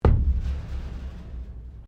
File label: METAL IMPACT RATTLE; Materials; Foundation